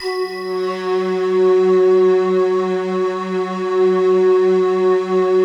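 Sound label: F#3, GLOCKENSPIEL FLUTE; Best Service; Advanced Media Trax Modern Composer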